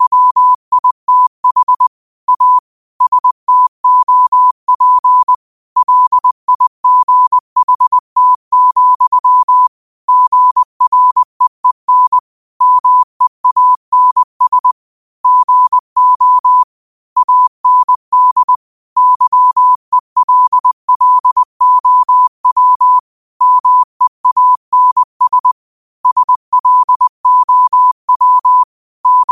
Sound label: 5 wpm, morse